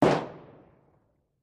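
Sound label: 2 Oz Black Powder Bomb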